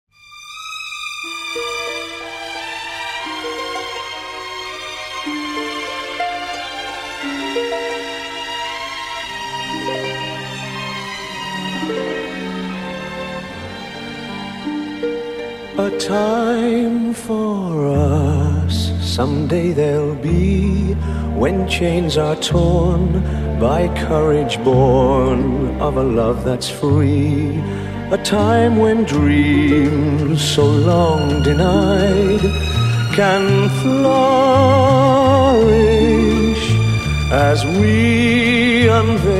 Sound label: Waltz